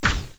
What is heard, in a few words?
Launch Strike Hit Impact 8Bit Chip Sound